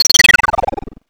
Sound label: stats down; mother2